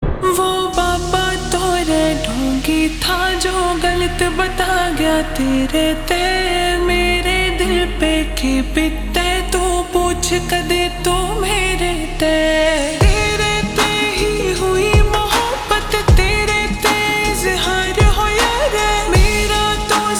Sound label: Slowed Reverb